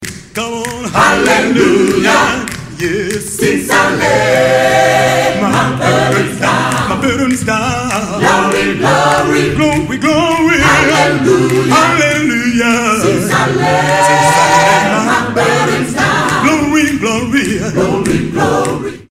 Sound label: Gospel